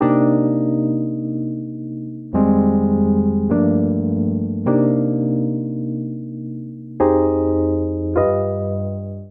Progression Boogie Chill i - iv - V7 C Minor 103 bpm, Electric Keyboards; Ableton; Factory Packs